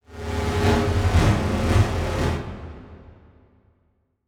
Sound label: Transition Whoosh Multiple; Production Element; Title Transition; AdobeCC